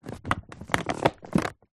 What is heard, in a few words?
game box push down apply pressure creak card; zapsplat